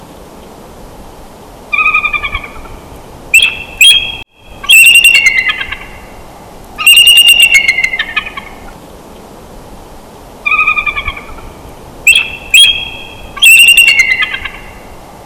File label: chortles